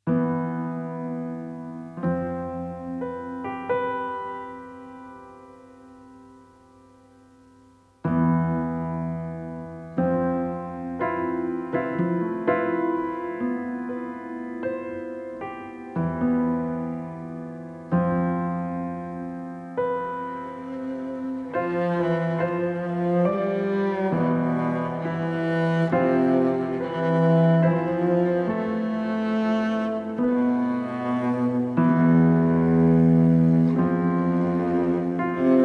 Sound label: piano